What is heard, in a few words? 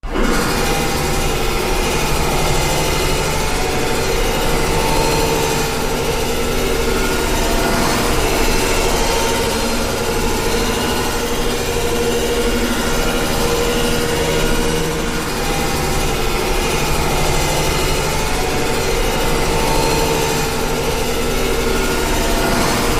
Oscillating Torment M, machines winches